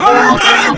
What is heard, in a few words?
hound pain; quake2; coop; monsters